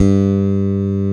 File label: E BASS, FINGER BASS